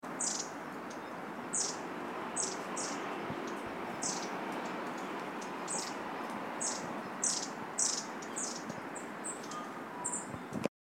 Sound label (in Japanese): tori enaga